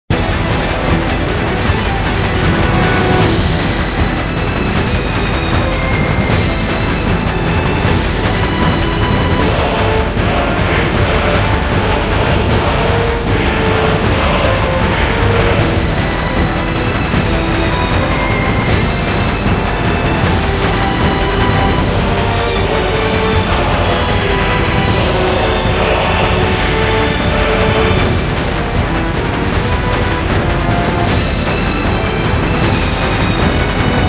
Battle Loop

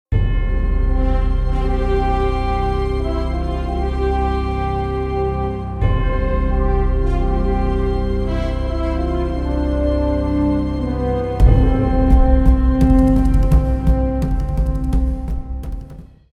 Jingle Short; Introducing Capetown

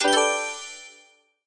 Continue

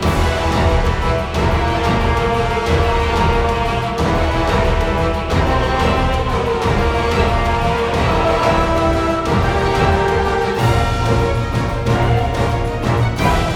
boss warlord music

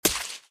Stab Knife

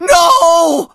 Scared Male; Friday the 13th: Killer Puzzle

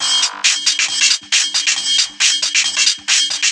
Radio Loop; Not Too Serious

